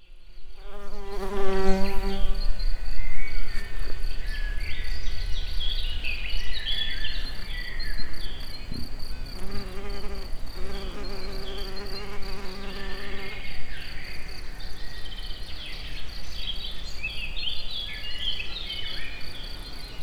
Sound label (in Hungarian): közelről, rovarok; termeszetben; egerturistahaz